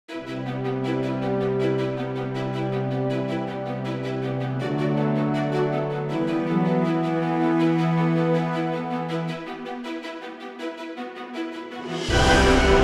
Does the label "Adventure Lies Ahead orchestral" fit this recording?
Yes